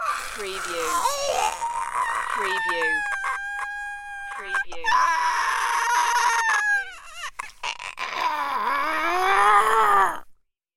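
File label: Death Rattle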